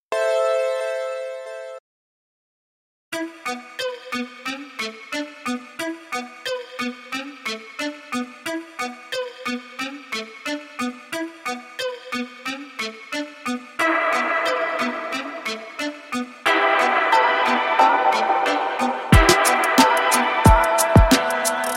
Trap

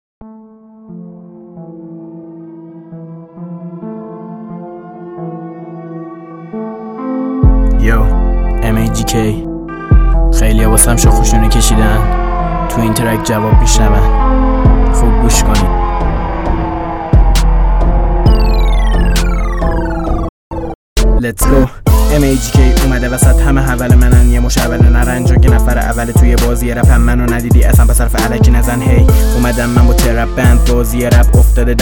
rap

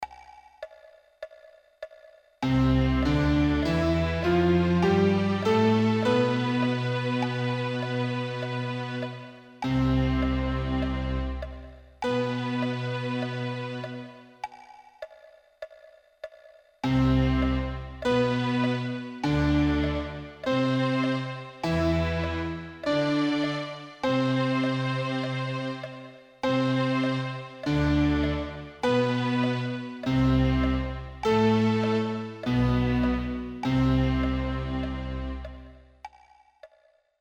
7th Intervals

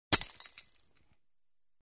Destruction Mug

